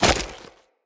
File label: Wall Jump Goo; Tenno; Footsteps; Wall Run